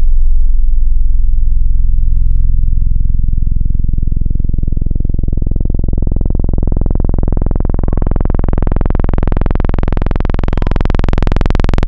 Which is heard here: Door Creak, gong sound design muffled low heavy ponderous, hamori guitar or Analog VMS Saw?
Analog VMS Saw